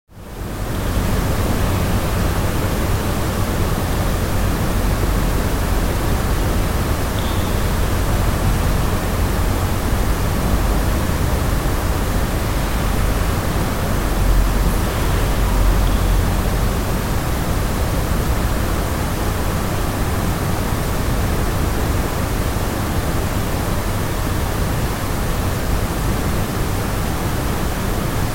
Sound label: Medium sized Stone Room Tone; Hollywood Edge Historical Series; Traffic Free BG's & FX